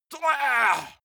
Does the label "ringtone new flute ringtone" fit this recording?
No